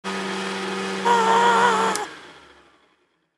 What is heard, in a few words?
Schredder; Troll Face Quest Unlucky; Android